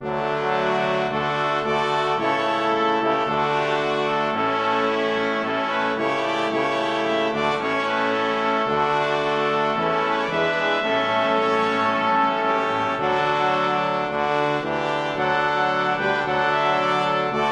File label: Hymn Brass